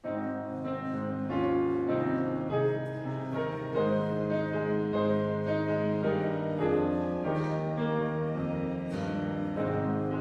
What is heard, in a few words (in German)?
Vorspiel